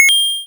SOUND COIN; super battle city 2

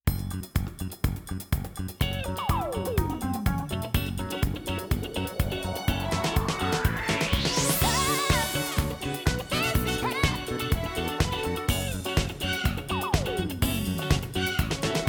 Thing Disco Ball; Paper Mario Color Splash

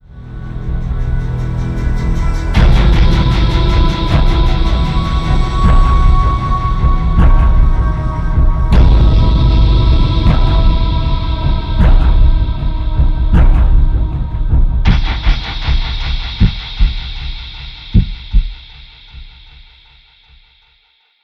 suspense; Call of Duty Modern Warfare Remastered

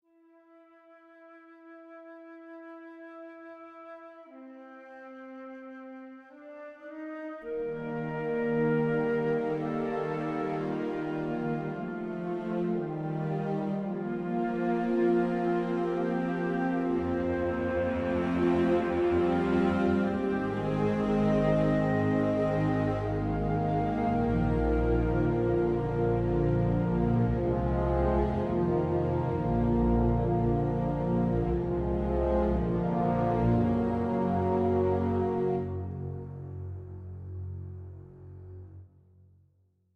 World Music

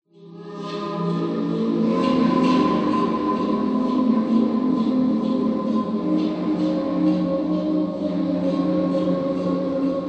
Jazz; The Sinking City; Interior One

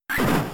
Quick Attack

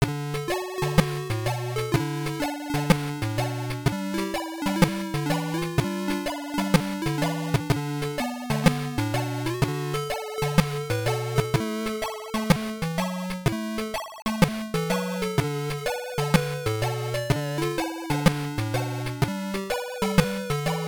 (Reggae Version); The Jungle Book; Master System

